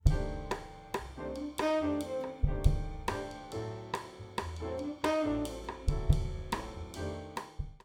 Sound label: Kick Meld